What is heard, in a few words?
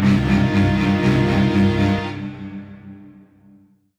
Dramatic Event Bosque